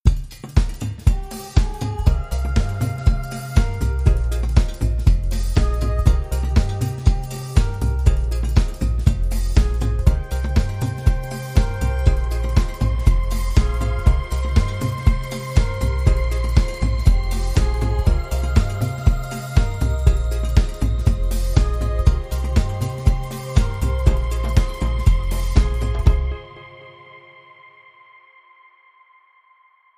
Funky Box

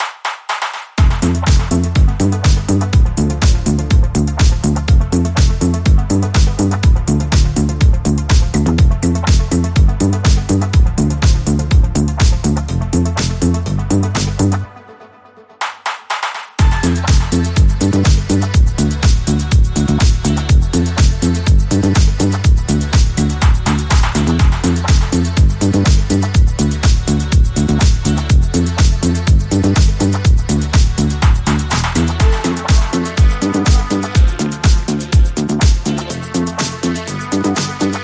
Electro Clubbin, Rhythm

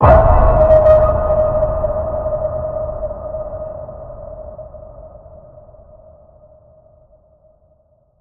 Deep Waters Ping D sharp

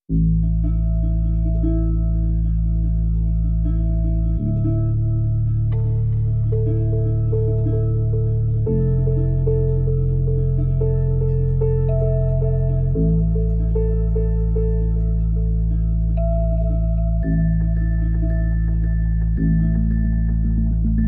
Neutral Lights Emerging No Drums

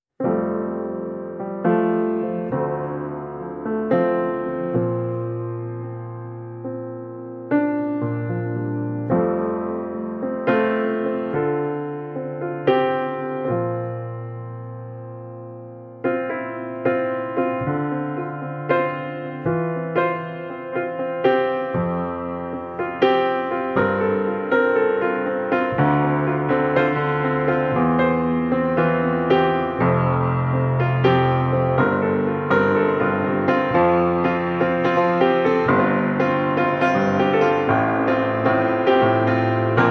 Piano

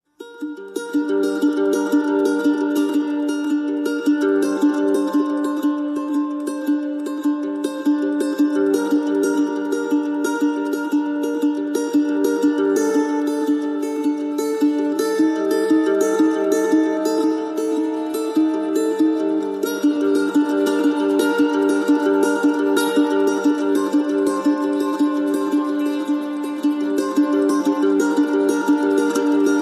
Mandolins Only Mix

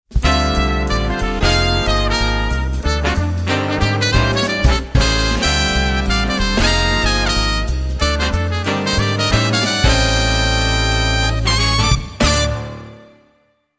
Jazz Brass

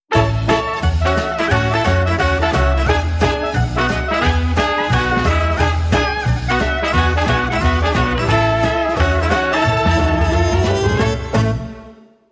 Jingle

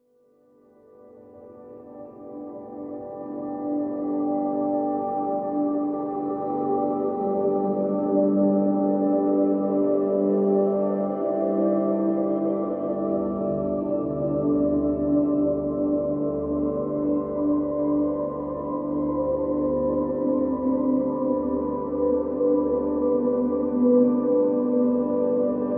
Pads Celestial (G)

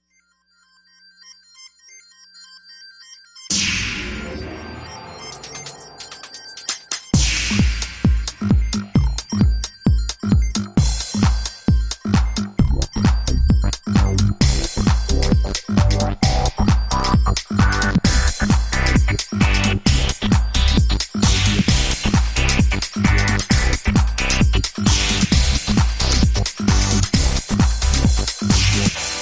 Techno Unlimited

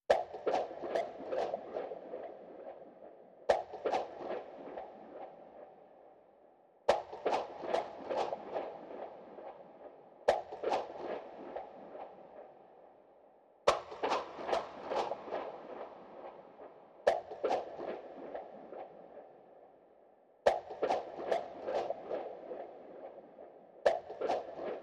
High Percussion Only

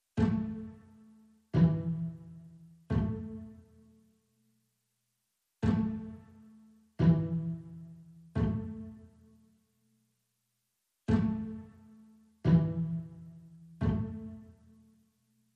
Strings Only

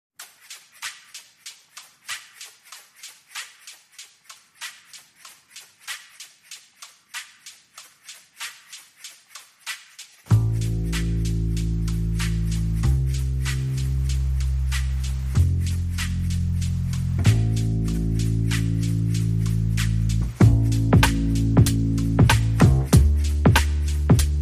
Drums and Bass

